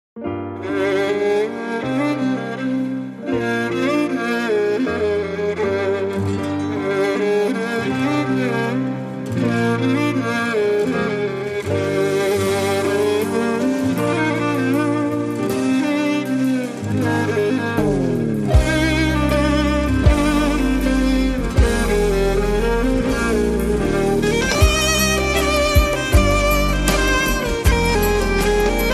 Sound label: Ringtone Mobile